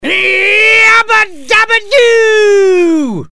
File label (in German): Jingels